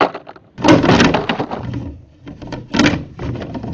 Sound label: stumble; bucky